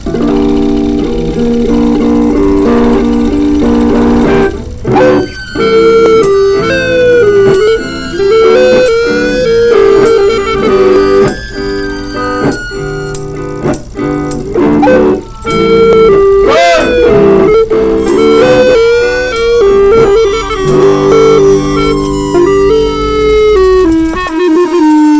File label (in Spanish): flauta